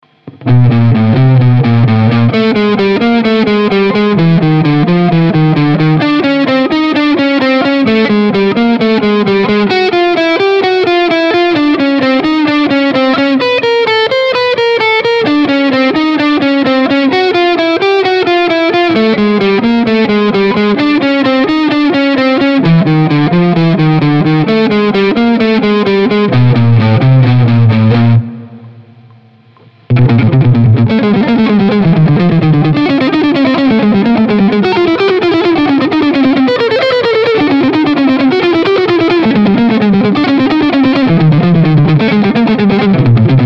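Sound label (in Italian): Esercizio Salto una corda, chitarra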